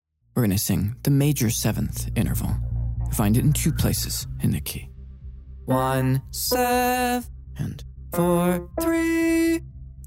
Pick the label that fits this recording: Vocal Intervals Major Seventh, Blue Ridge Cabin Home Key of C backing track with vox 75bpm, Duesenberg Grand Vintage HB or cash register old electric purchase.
Vocal Intervals Major Seventh